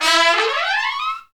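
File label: trumpets FX, Brass; Roland L-CDX-03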